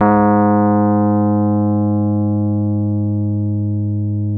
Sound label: RHODES G#1; Sonic Reality - Vintage Timetraveler